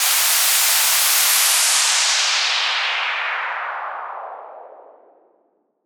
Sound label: White Noise Down 80 BPM; Cymatics - Odyssey